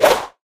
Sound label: pickaxe swing; tf